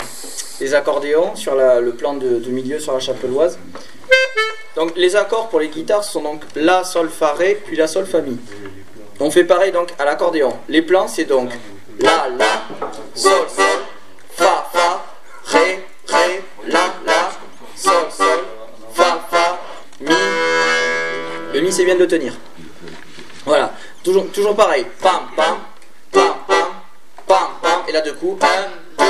Chapelloise plan du milieu diatos guitares

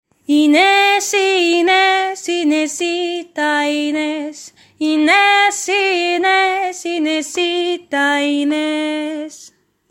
haute refrain; Ateliers chant

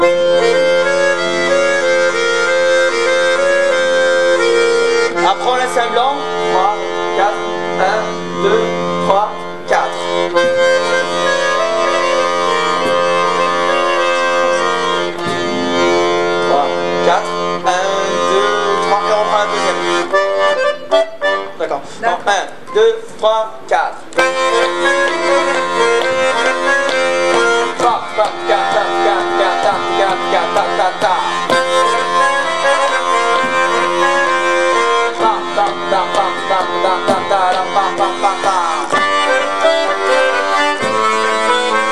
version orientale

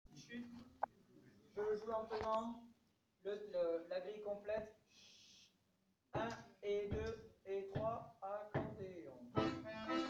Accompt diatos; Megastive; Musique d-ensemble; Cercle circassien

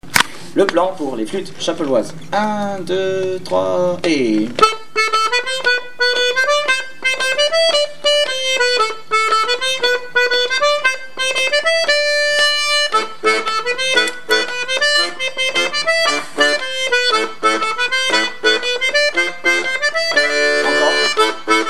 Chapelloise flute

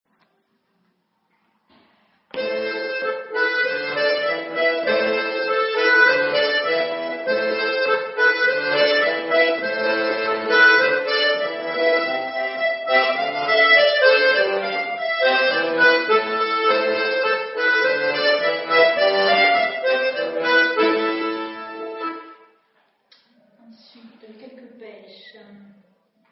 Rondeaux; rencontres-2014

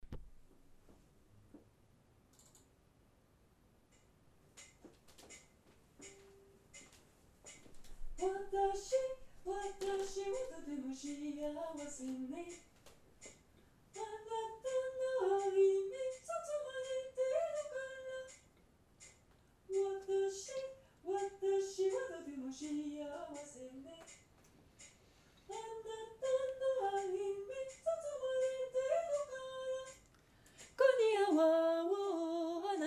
basse

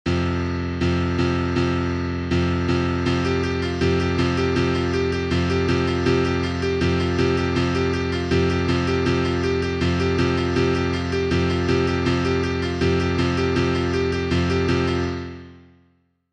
Ostinato accordéons; Branle

